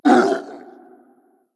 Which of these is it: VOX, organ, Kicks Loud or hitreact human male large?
hitreact human male large